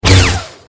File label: saber hit